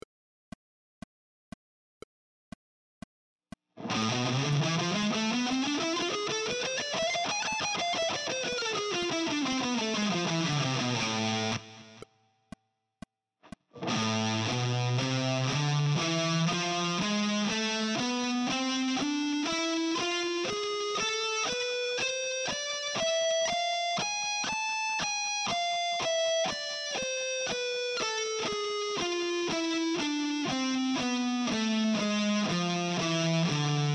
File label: harmonic minor